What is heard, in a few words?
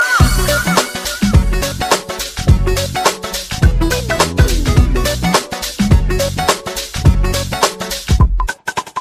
message new for girl